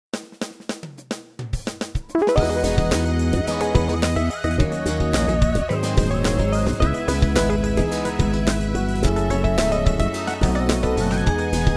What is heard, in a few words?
vocal; Ｓな彼女 エンディングボーカル曲